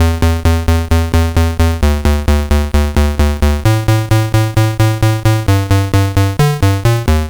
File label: Game Boy Bass; Nintendo Game Boy